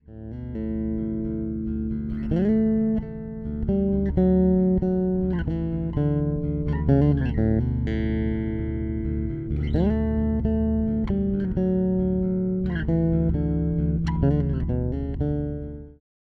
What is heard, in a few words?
Sadowksy NYC Jazz Bridge Pickup aktiv